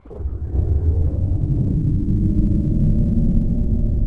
turbine start

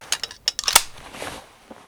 Inf Weapons Foley; Red Orchestra Ostfront 41-45

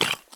run tile; Escape from Tarkov

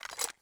ui pickup gun; Rust

